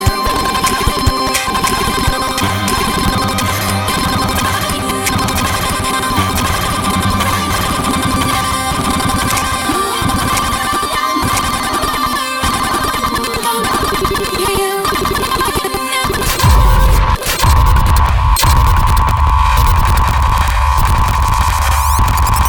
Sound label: jingle; hitparty